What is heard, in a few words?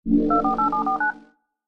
video start; bitrix